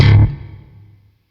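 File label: Sentient Shawzin Slap Octave One C Sharp; Chords; Warframe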